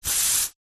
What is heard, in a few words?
household aerosol insect spray single short burst; zapsplat